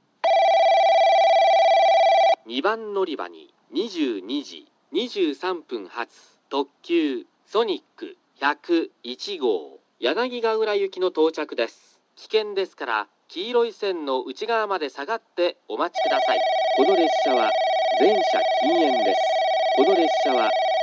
kurosaki sonic yanagigaura; 2番のりば接近放送（ソニック101号 柳ヶ浦行き）

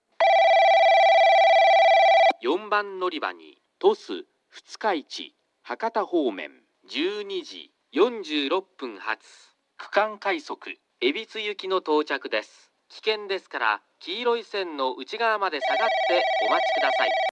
kurume regional rapid ebitsu; 4番のりば接近放送（区間快速 海老津行き）

